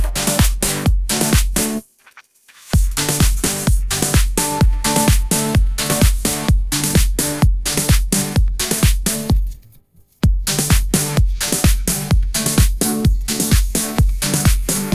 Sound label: electronic dance music, ringtones